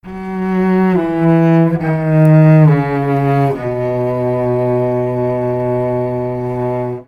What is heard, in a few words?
cello quality recording